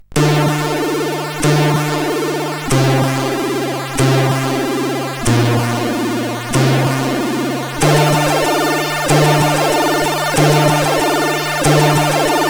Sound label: Music Box tune, CSG8580R5